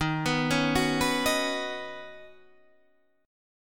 D sharp Minor 7th Sharp 5th D sharp, down, Guitar, Standard; arpeggiated; JGuitar